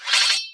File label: scratch